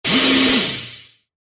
機械の動く音 短め モノラル

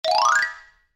場面切り替え 木琴 上り; 場面切り替えや心理描写; 演出効果音

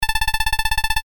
会話

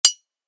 瓶を軽くぶつける音

